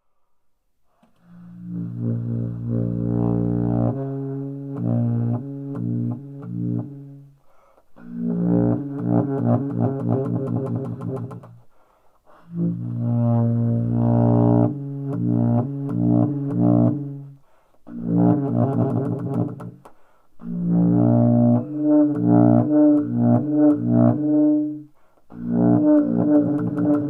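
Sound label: Table of C Bass Sax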